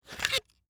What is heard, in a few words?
Technology Electronic Audio Tape Remove From Case